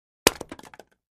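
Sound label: 2x4 Smashed Sledge Hammer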